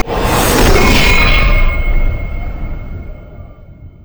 windwall; LoL; yasuo base

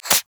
blinding dart; LoL; teemo

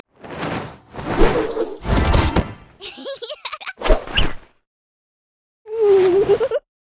recall wind u; LoL; annie anniepanda

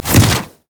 wildfire; LoL; brand zombie

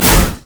mage chains; LoL; ironforge xerath

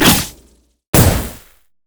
disintegrate; LoL; annie base

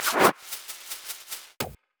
emote laugh; Ziggs

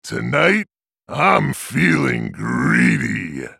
attack; Trundle

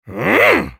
effort; TryndamereSultan; en US

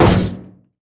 wolfman vs metal; LoL; warwick

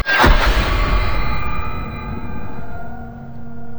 tatsumaki; LoL; yasuo base

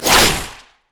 crit attack; khazix

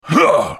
effort; Galio; Character Voices - English

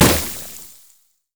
caustic spittle; LoL; kogmaw

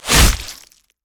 basic attack claw; rengar

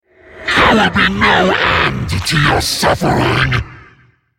attack; Greenterror; Character Voices - English

